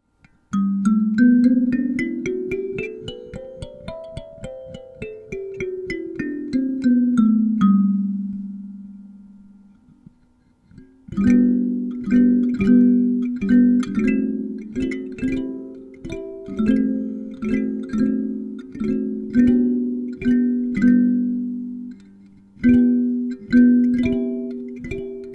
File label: piano, kalimba; maple